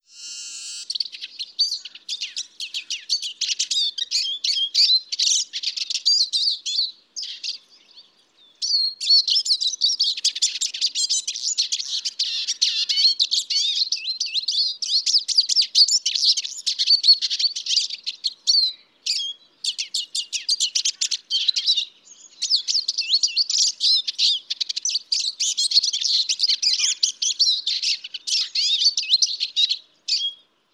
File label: Eurasian Siskin